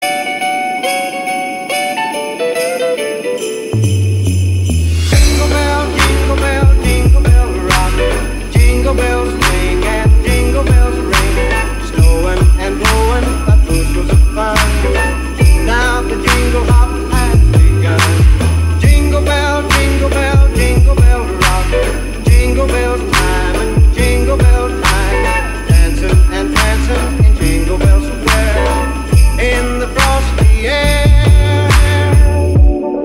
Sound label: Remix